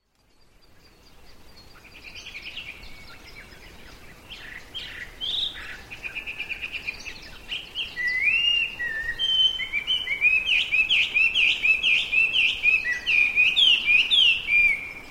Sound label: Hwamei